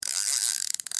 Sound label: casting rod; ethereal